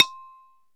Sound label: MLT R8 XYL, R8 Xylophone; Roland LCDP11 Africa VOL-1; Bala & Xylo